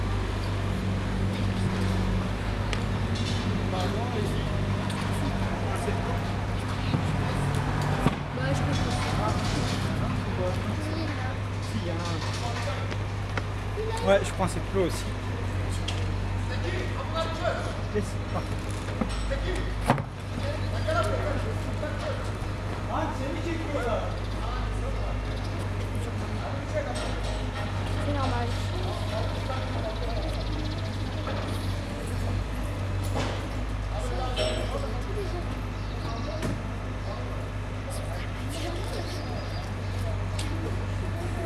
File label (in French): travaux dans le bourg